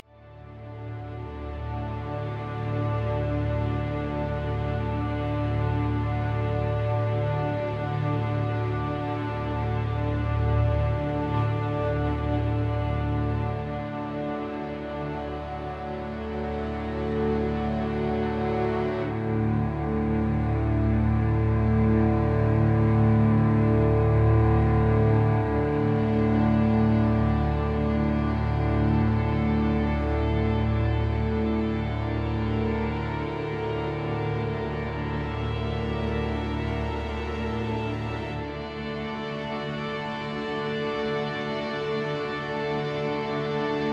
Camp Strings D

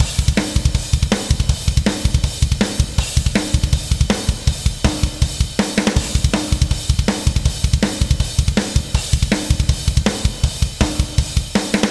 Drums and Jingles Battle DRUMS; B 2 Festi; Metal Tales Fury of the Guitar Gods

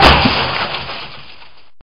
Crash; Dr. Driving; Android

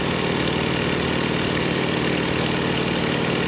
prop plane; Birthday Party Bash; Wii

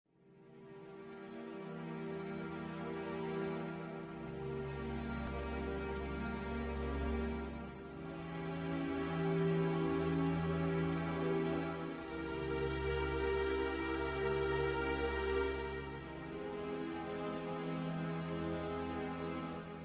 Dialogue; Evil Dead A Fistful of Boomstick; PS2; Xbox

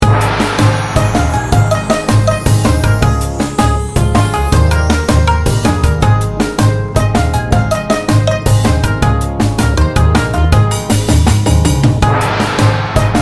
Raid; Mist Train Girls

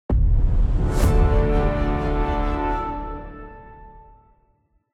UI MP RESPECT UP

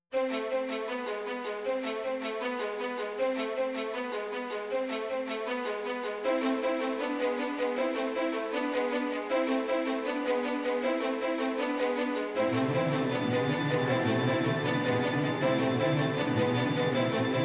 feel tension

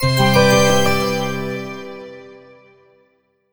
Jingle Over

